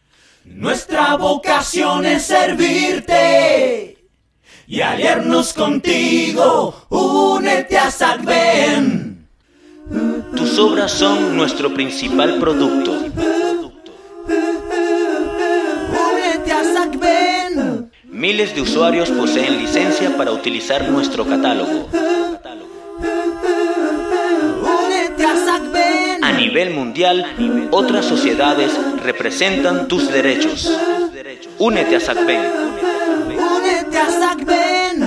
Solo voces; Unete A Sacven

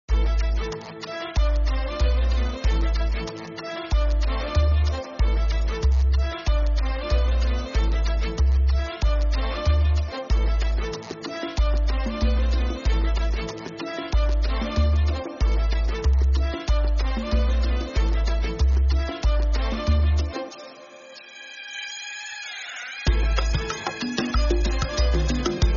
Ancestral Viaje Comienzo Baile Mar Barcos Caballos

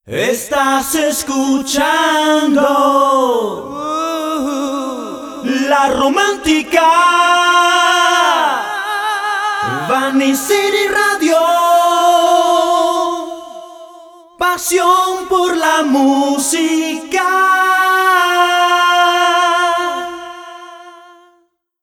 Pop Voces, Jingle Cantado (A Capela); BanicityRadio

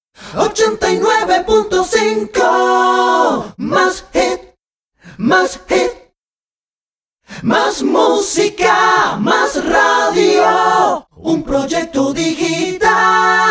Pop Jingle VOCES; Más Hit 89.5 Máxima

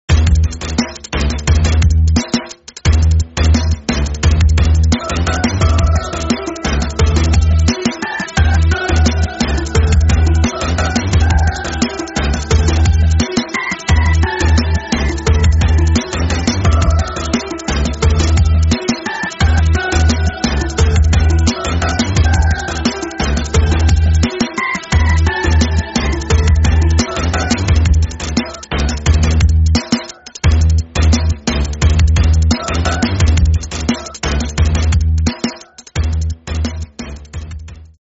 Violenta Asechante Intensa

